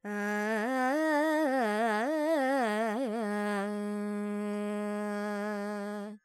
Purvi Kalyani, Vocals, Carnatic Female, Alaps, Dry; Sample Banks